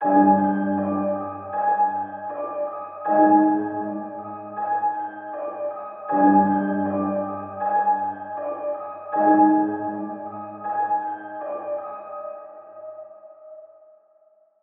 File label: 158bpm G min, melody